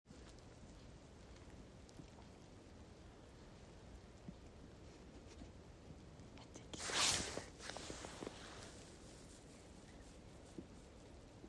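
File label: owl singing on a snowy winter night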